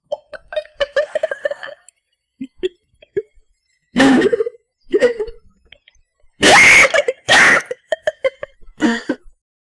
a young woman gurgling cho, bubble popping

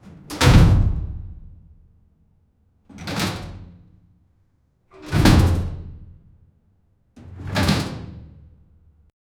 heavy sliding metal doors, automation

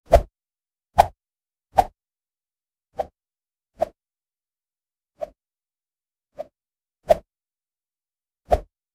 short light jump, airborne

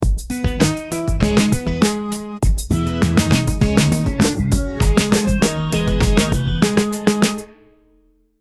funny spooky theme song

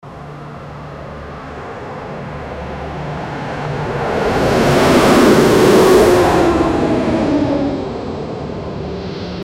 an airbus passing flying, aircraft

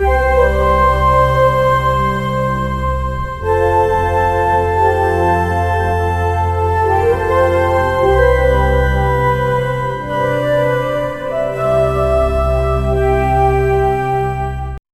calm background music, forest

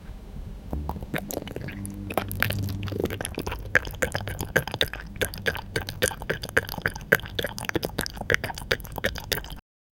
cat drinking water; domestic animals

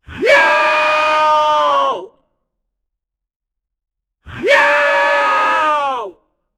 weightlifter scream

